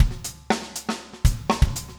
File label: funk 120 bpm, loops